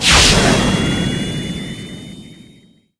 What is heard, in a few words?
booster; sonic